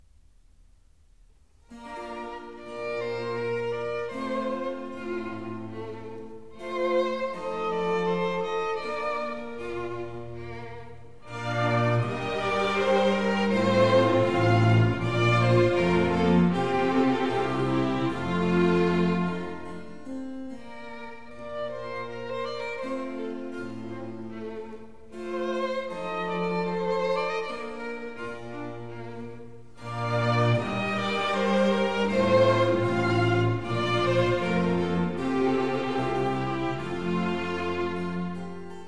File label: Sarabanda. Largo, Conciertos Grossos; Concerto No.11 Bb